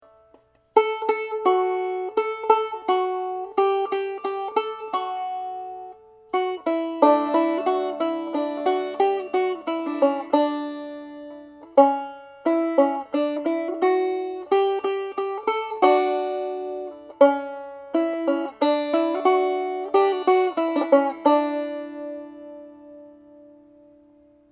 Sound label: rock melody